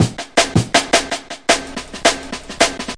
161 bpm, drum loops and breaks

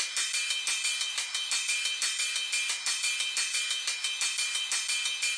rides; On A Roll

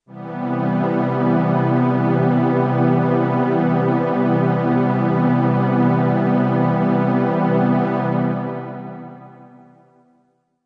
soft synth seventh; Pads

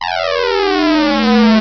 hard sweep, Synth

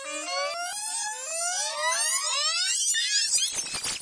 backwards winding mosquito party; Electro and Synthetic

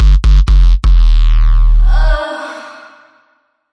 drop bass hit; 125 bpm; 121 to 130 bpm

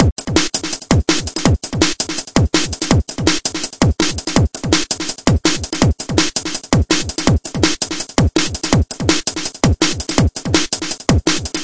165 bpm pitchy dnb, drum loops and breaks

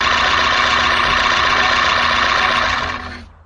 Electro FX; Ueb HE Electro FX

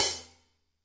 real Hi Hat; REAL LIVE KITS; A Real Freakin Drum Kit

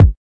kick; kik; drums single hits

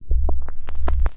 climbing noise; Electro and Synthetic

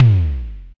Simmons SD-5 drum machine

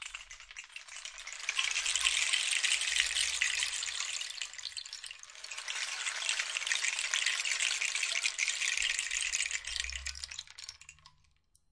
rain stick journey; ETHNIC and WORLD PERCUSSION; Rainsticks

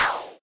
sd, snare; drums single hits; tokyo jet

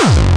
obnoxious kick

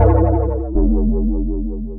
bowowo wowowow bass; Basses

